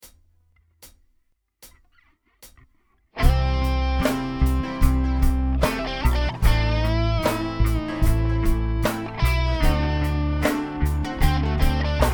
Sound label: Muffin Man with Lead